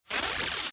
reality breach spark; Stellaris; nemesis

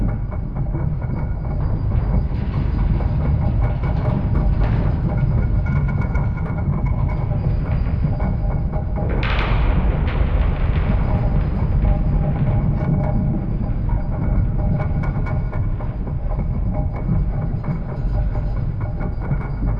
scavenger bot idle; Stellaris; distant stars

